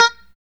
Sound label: POP A#4, STRAT guitar; Spectrasonics; Hans Zimmer Guitars VOL-1; JC-STRAT POP